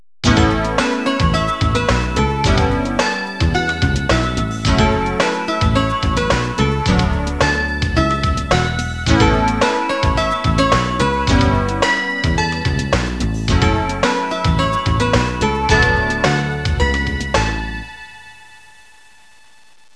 suonerie businessy

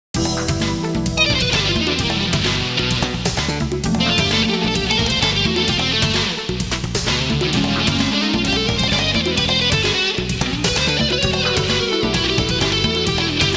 suonerie musica jazz

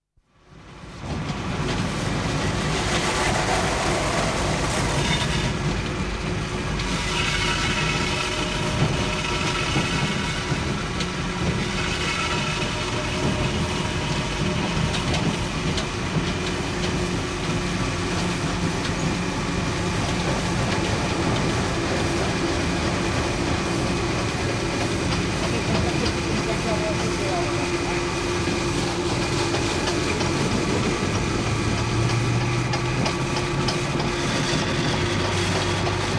TRENO PASSAGGIO; trasporti; treni

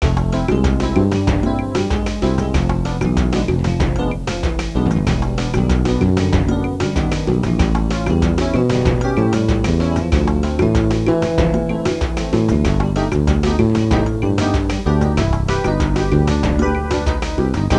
suonerie contemporanea; musica contemporary

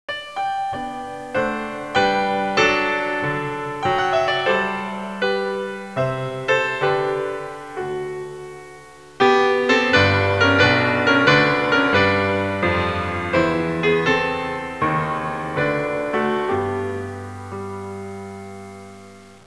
suonerie classica; musica classical

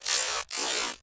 suoneria botton; beep

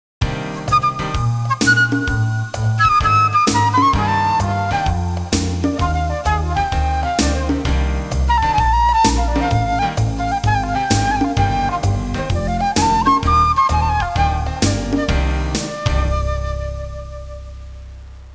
suonerie musica jazz

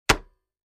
suonerie telefoni fax; phone

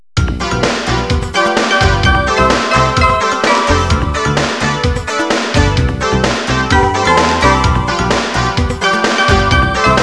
suonerie businessy

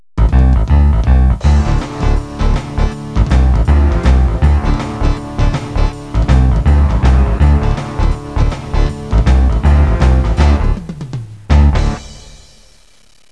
musica newage

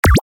suoneria botton; beep

